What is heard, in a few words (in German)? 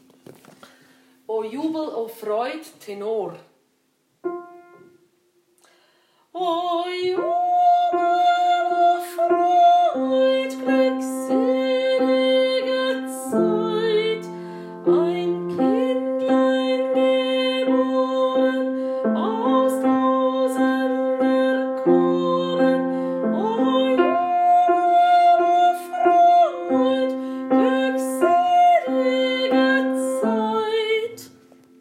Tenor